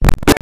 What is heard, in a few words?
chimes